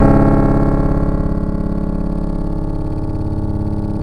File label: C#, PIANO; Big Fish Audio; Synclav FM